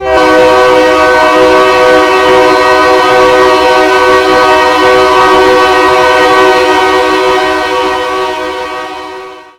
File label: k5la, horn; run 8; misc horn pack; bnsf